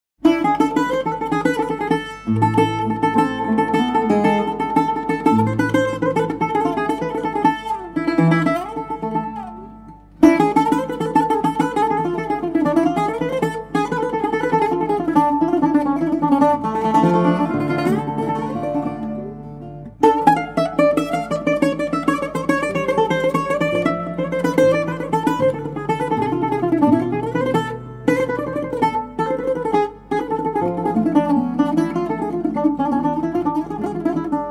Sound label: Hijaz solo bouzouki